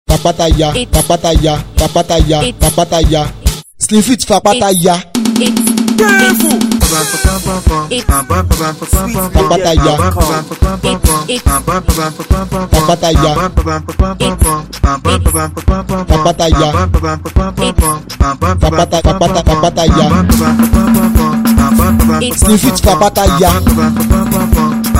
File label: Beat